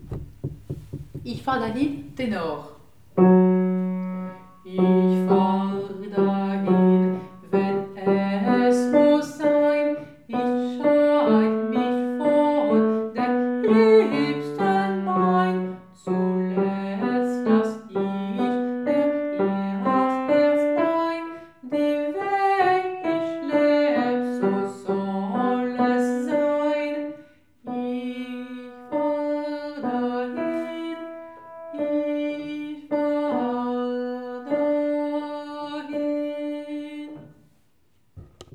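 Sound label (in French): Ich fahr dahin Ténor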